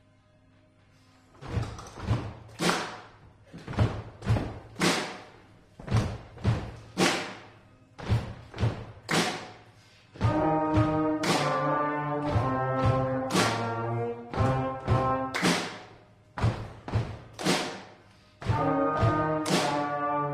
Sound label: power rock; Konzertmusikbewertung 2024; Frühlingskonzert 2024; Bläserklasse der VS Angern; ortsmusik ollersdorf